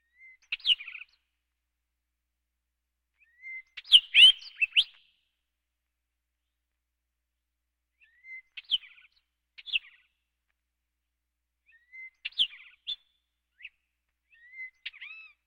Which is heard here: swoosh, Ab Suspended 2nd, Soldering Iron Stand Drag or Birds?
Birds